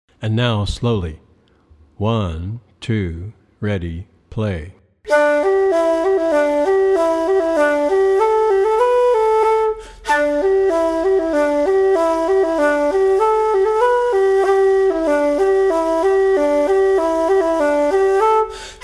Polka slowly

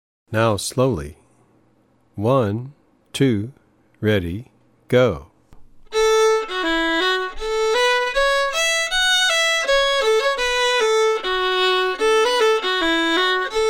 Polka slow

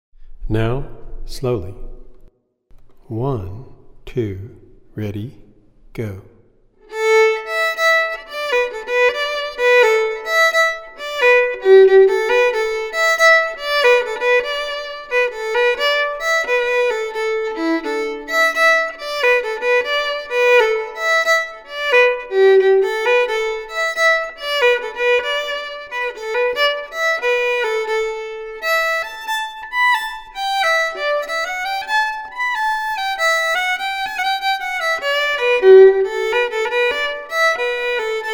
Slide Slow

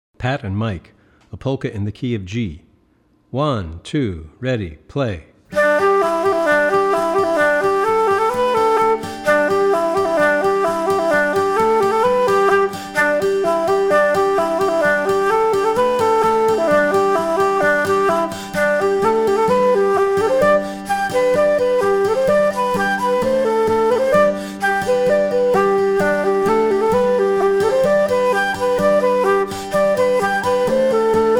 Polka

